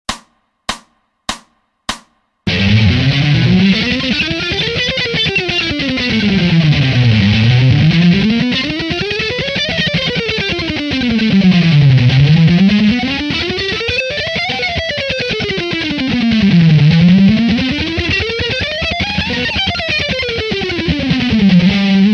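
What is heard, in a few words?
3 Notes Per String with Chromatic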